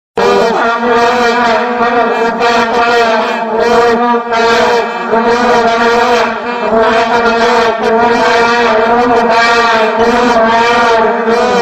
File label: goalhorn